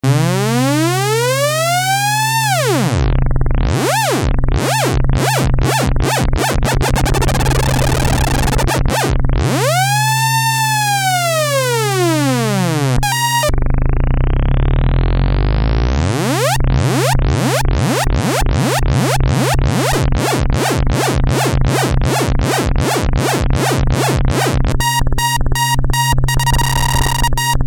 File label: lfo, redsound darkstar; TEST: LFO range on pitch + shapes